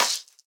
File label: Splat Squish